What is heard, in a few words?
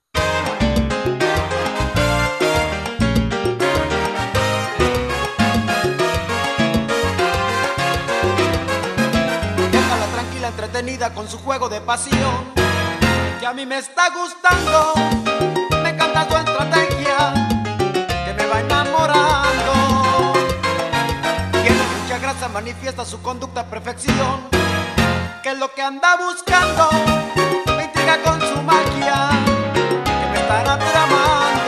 Salsa